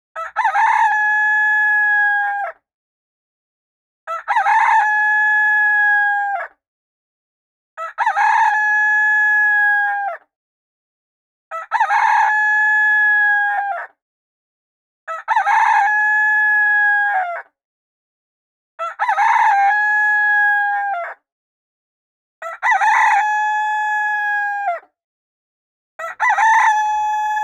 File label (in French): coq